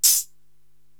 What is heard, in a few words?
HH Tekno Noize Open, Korg TR-Rack drum machine